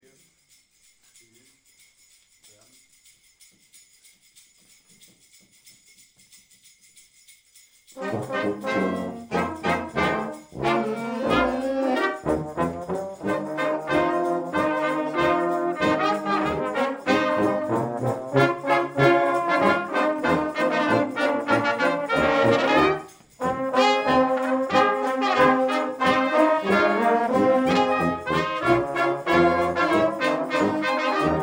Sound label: Jingle Bells